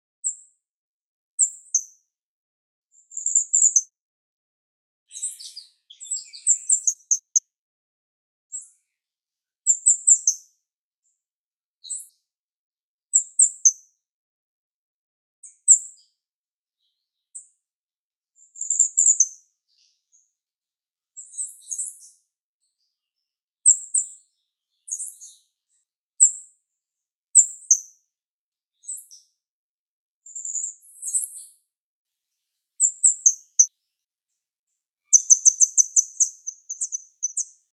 Foudi rouge, oiseaux de Mayotte; oiseaux terrestres; faune de mayotte

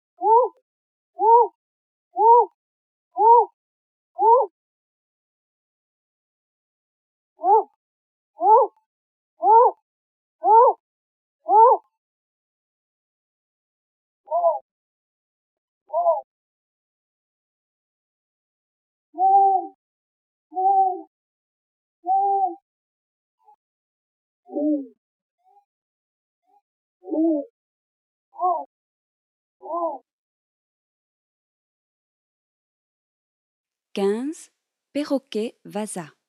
Founingo des Comores, oiseaux de mayotte; oiseaux terrestres; faune de mayotte

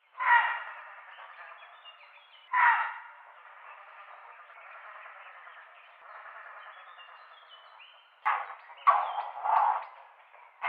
Héron cendré, oiseaux de mayotte; oiseaux terrestres; faune de mayotte

